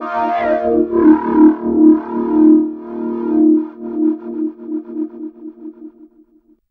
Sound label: SE OVERD., Overdrivin'